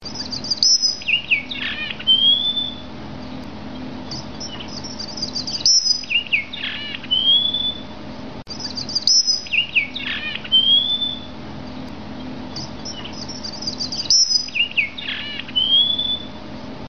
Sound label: strawberry